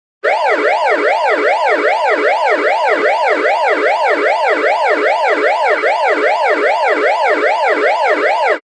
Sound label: Siren from a toy.; Part of Toy Sirens (Sound Effects:Toys)